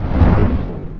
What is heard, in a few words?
preattack; dota; grimstroke